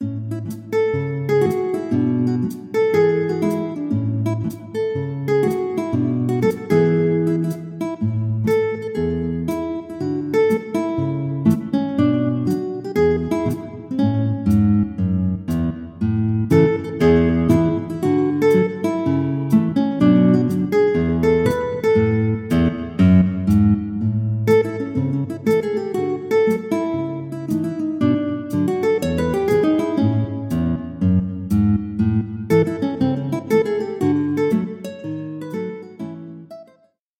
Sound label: Easy Guitar